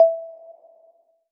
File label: noti